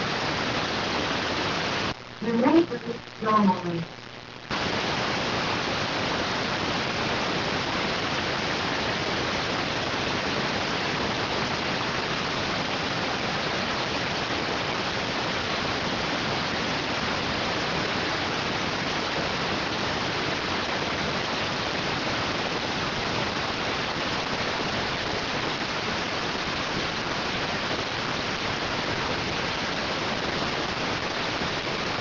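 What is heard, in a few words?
radioteletype receive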